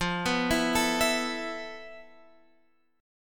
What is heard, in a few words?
F Major 7th Flat 5th, Guitar, Open F; arpeggiated; FM7b5; x,12,11,11,12,11; JGuitar